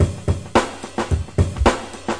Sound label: 108, DRUM LOOPS and BREAKS; 101 to 110 bpm